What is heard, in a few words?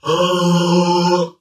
"oh" sung